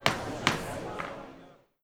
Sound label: tab government; europa universalis iv